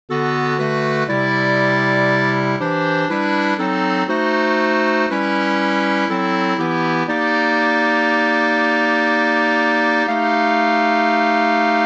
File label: Bari, barbershop tags